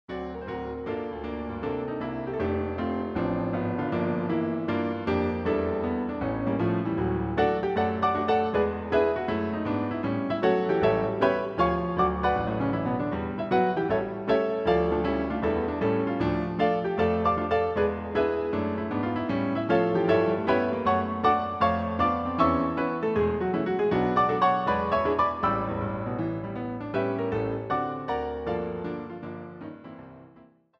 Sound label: piano roll